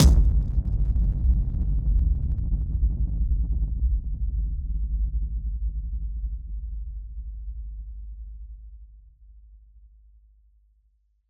Hit Splosion; musicradar; bomb factory

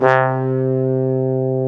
TRUMP C2, Synth; TRUMPET

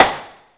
WN SNARE SLAP, synth perc; Zero-G; Ambient 1

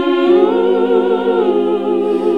SING, CLASSIC VOX; Best Service; Hallelujah

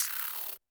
HiHat & Crash, Roland TD-20; HiHats

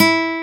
NYLON E 4; AKAI S-Series CD-ROM Sound Library VOL-1

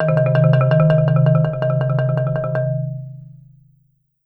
D#2, Marimba; roll; Sampleheads - Dave Samuels Marimba & Vibes

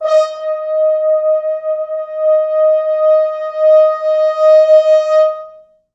4 FrHorns

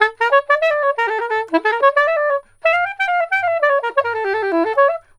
SAX, Balkanish Traditions

